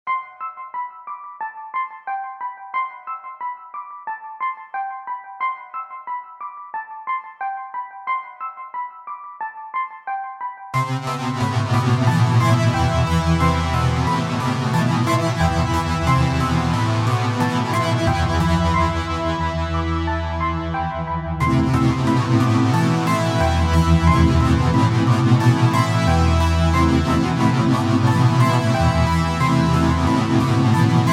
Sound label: Piano Rain